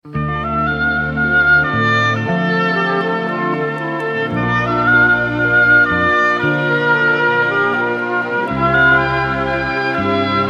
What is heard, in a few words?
instrumental